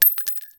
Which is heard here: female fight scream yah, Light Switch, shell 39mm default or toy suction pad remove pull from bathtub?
shell 39mm default